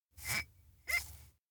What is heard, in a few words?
cup polish; anemoi